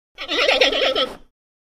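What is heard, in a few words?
Monkey Titi Call Whine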